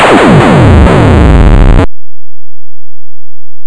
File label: CANNON